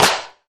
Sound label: disco hand clap sound D key; single hits one shots